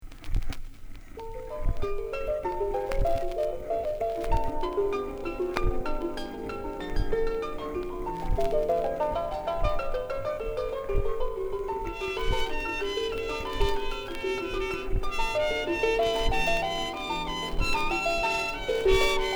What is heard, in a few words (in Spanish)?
preludio concierto para arpa y quinteto